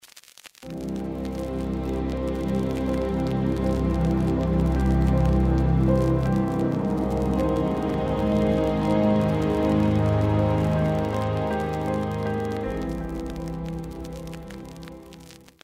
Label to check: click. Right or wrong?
Right